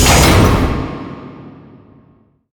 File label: door stop